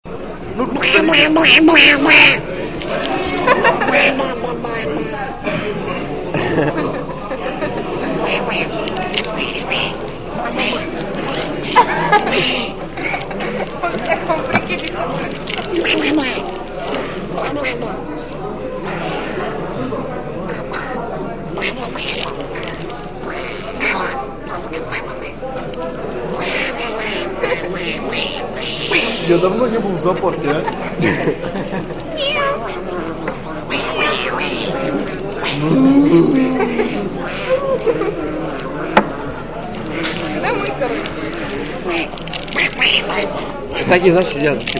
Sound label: ducks